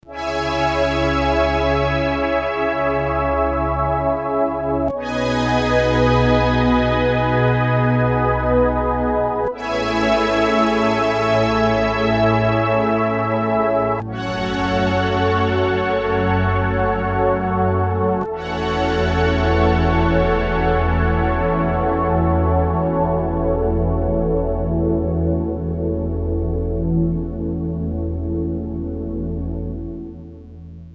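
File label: Soft Synth Orchestra; Digital Synthesizer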